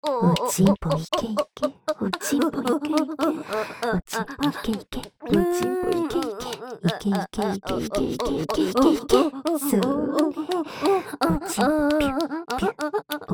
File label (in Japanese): 無様に射精しなさい♪＋高速オホ声; おまけループ（オナサポ）; 性女セシリアに馬鹿にされたい！